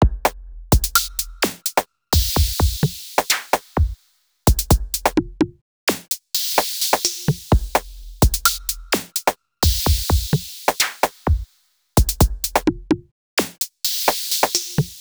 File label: Drum Patches; Primer